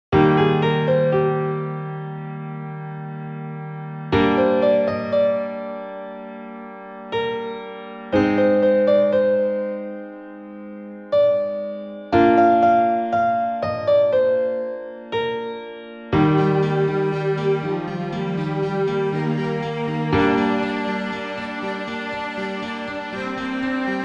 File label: good night